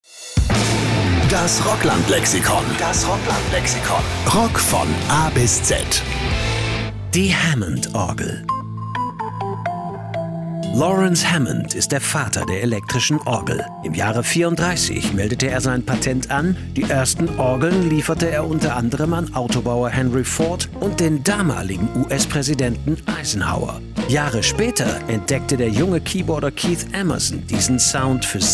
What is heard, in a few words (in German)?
hammond orgel; rockland lexikon